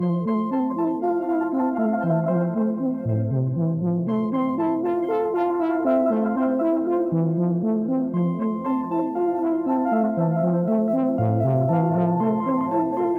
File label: Music Skip; sayonara wild hearts 2019 gamerip